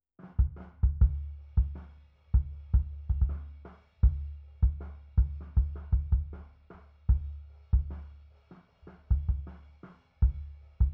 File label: Kick SUB